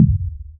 Perc Hit, Noise; noise hiss crackle; Noise Perc Hits

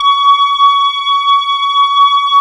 D5 SYNTH, SYNTH ORGAN; AKAI S-Series CD-ROM Sound Library VOL-7

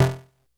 Snare, Korg DS-10

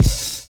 JAZZY; Univers Sons

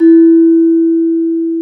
GENDER, Bronze Gamelan; E-MU Formula 4000 Series Vol. 4; Earth Tones

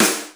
SNARE, DRUMS; Crypton - DrumBase5000; T1 DRUMS

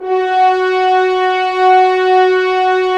Horns; Roland - LA Composer vol. 4

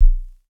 Subbass, Univox SR-95

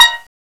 STEEL, MALLETS; FAIRLIGHT CMI IIX

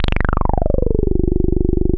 G1, Bass; CRANDLE; E-MU Producer Series Vol. 6 – Analogia Project (CD 1); Boom da Bass 1

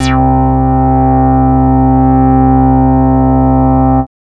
Synths Note C

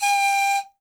PIPE G 5, REED PIPE; Africa; Spectrasonics; Heart of Africa 1

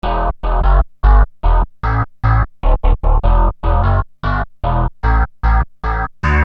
Syn-Bass m Em, Techno Synth Loops, 150bpm; Sonic Foundry (Sony Creative Software); Signals I

